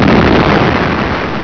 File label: BOOM